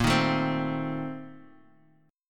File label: A sharp Major 7th Suspended 2nd Suspended 4th, Bass Standard; A#M7sus2sus4; strummed; JGuitar